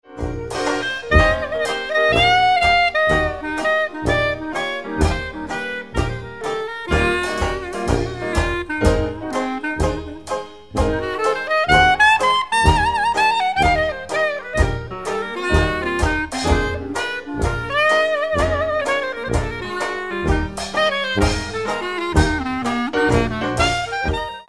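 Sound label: clarinet, jazz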